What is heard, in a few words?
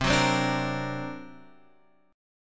D Augmented 7th C, guitar